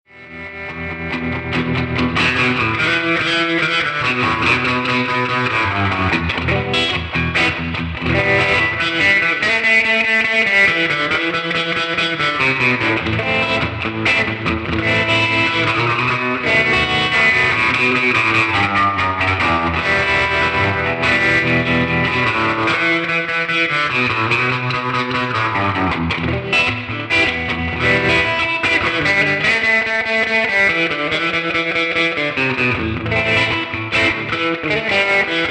Surf 67 Black Duo with 2x12 Black Panel Duo and Ribbon 121